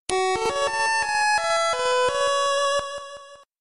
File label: Lullaby